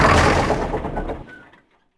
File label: Tank End; Civ4 warlords